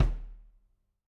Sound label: Loose Kick